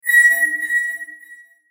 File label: MOUSE OVER, Mouseover High Pitch Delay Shimmer; Multimedia; Blastwave